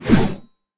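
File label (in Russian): размашистый удар мачете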